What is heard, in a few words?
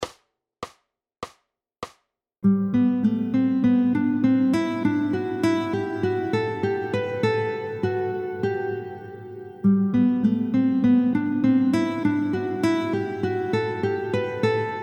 Guitar Gym Sequencing oefening